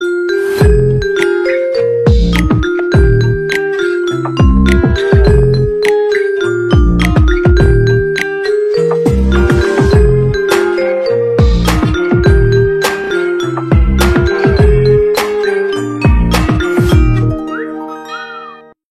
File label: Marimba Remix